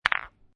Billiard Pool Snooker Two Pool Balls Clacking Together Rattle; Lynne Music